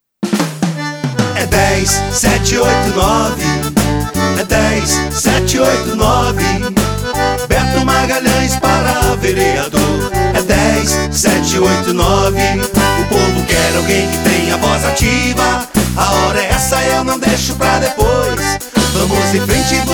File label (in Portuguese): Jingle para Vereador